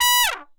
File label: trump stab b